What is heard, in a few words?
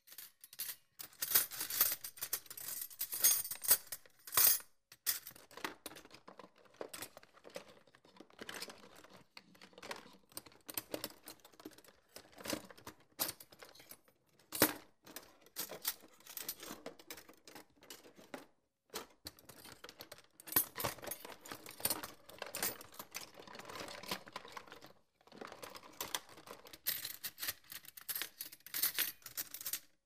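voznya v yashike dlya nozhej, rummaging in a knife drawer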